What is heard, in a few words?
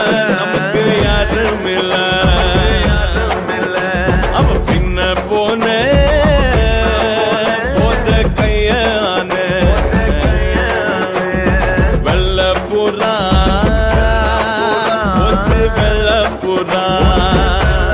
tamil song ringtone